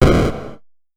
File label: bomb; spoonman; dota; crownfall